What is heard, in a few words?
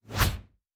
Double Jump, Combat